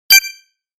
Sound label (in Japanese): ピッ音